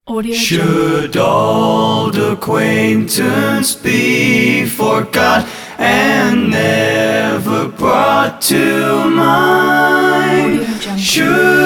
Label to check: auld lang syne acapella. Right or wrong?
Right